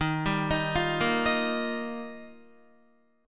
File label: Eb Major Add 9th, Guitar; Ebadd9 arpeggiated; 11,10,13,10,10,10; 4E,4A,5D,5G,5C,6F; JGuitar